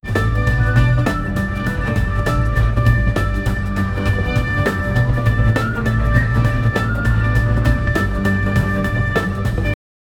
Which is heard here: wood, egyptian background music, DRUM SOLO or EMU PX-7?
egyptian background music